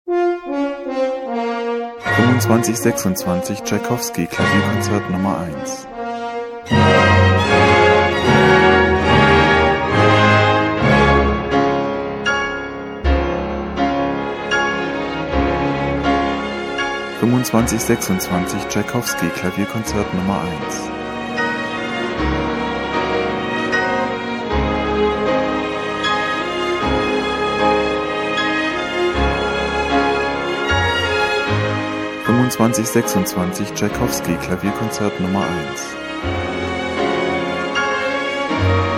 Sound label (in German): Klavierkonzert; Klassik; Tschaikowski; Klavierkonzert No. 1